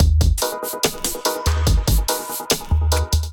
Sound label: Kit groove 143 bpm, Drums; MIDI Clips; Ableton; Chop and Swing